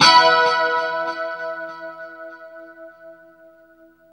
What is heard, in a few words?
2CHD E 5 -R, Guitars; Spectrasonics; Hans Zimmer Guitars VOL-1; JC-SHEEN